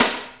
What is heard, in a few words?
One Shot Snare, Drum N Bass - Percussion